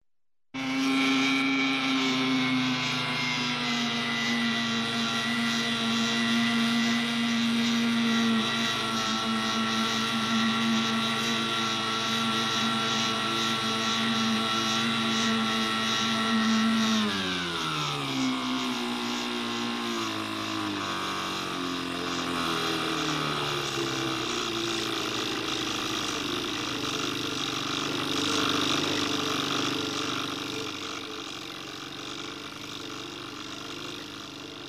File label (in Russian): motornaya lodka visokaya skorost sbrasivanie gaza pri priblizhenii ostanovka